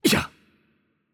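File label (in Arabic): Hurt Light, Arabic; ar AE; VO; bloom minigame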